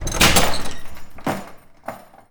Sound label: Door Bursting Open